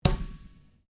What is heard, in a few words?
Ball Swiss Bounce Slap Bat, Large Swiss excesize ball; Equipment; Sports